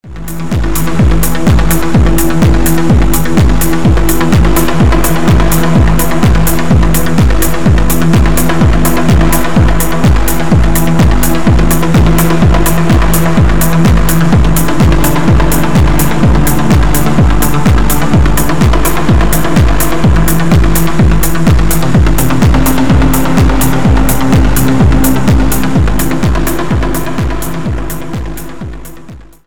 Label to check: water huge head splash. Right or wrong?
Wrong